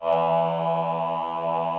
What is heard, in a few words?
VP CHOIR, VOCODER; Greytsounds VOL-1